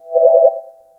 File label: light airy swoosh with a; reveal